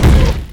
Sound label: dog footstep run; hl2